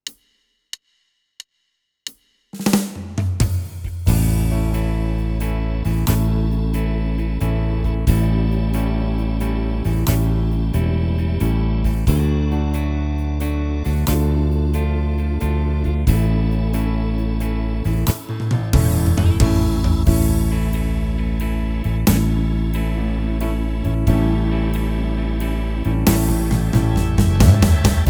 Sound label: style r and b gospel sisters, yamaha tyros3